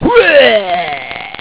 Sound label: uuaargh